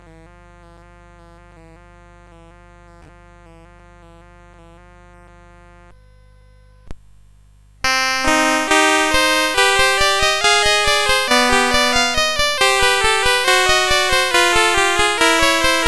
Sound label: Crab Canon, CSG8580R5